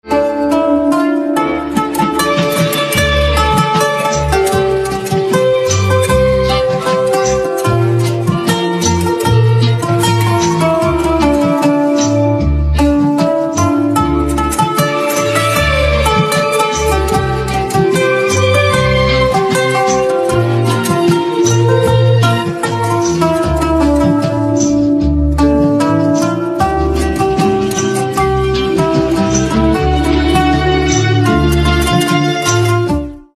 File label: Rubab